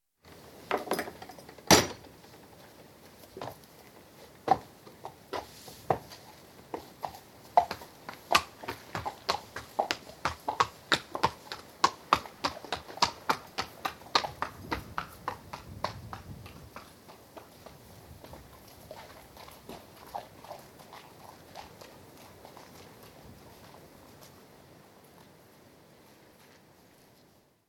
Stable door opened, horses lead out, some wind noise; Horses Stables; BBC